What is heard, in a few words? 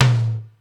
Rock Tom, Ensoniq ASR-X Pro